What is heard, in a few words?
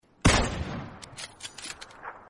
huge blast sound sms tone